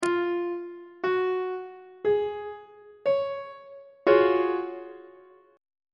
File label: g flat major 7th suspended 2nd 3rd inversion on treble clef